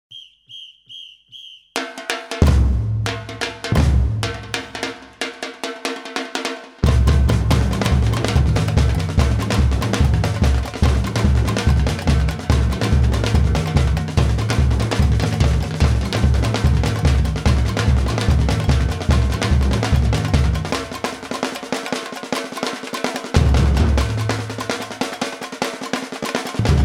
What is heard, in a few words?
Bongo, samba